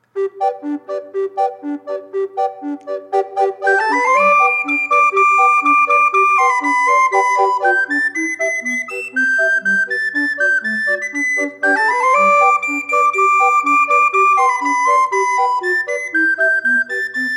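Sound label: air pizzicato; anisong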